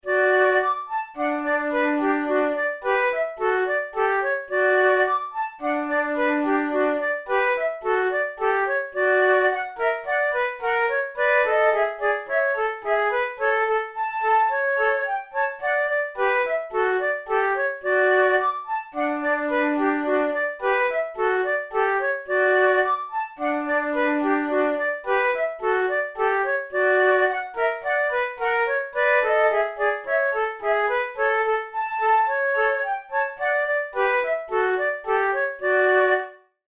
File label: methode de flute; tulou